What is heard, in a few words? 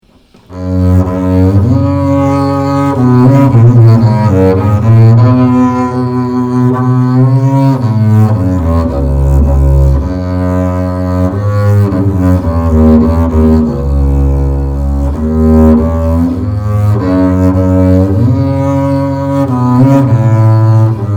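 antique double bass sound sample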